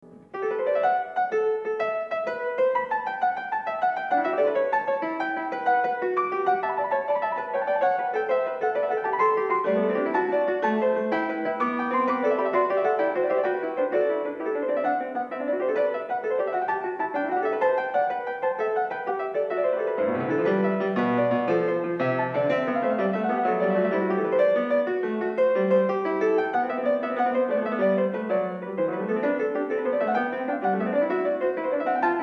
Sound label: Fugue G-dur No. 3; Shostakovich; Preludies and fugue op.87